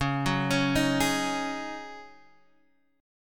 C sharp Suspended 2nd Suspended 4th, Guitar, Half Step Down